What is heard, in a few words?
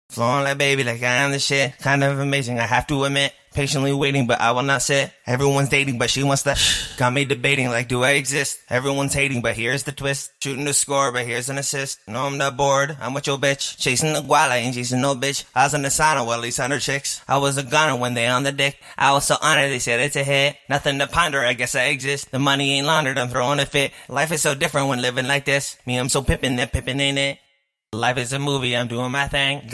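trap hip hop 140 F sharp major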